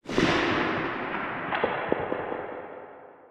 echo underground

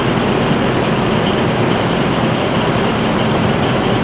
POWER GEN FAN

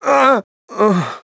DYING; BattleSNDS

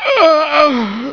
DYING; BattleSNDS

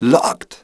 locked; BattleSNDS

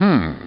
humm; BattleSNDS

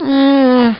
DYING; BattleSNDS

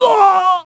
HIT; BattleSNDS

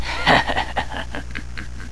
LAUGH; BattleSNDS

